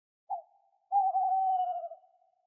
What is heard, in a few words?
owl